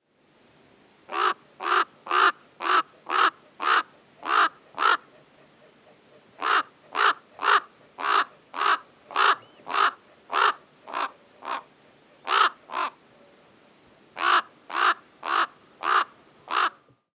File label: Northern Raven Corvus corax